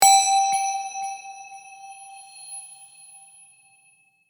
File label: Meditation bell ring chime tone